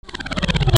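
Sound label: percussion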